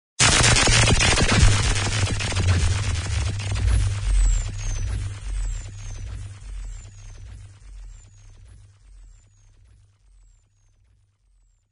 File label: power lazer; Laser